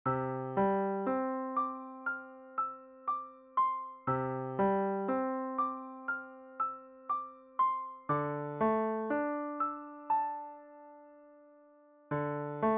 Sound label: April Rain MIDI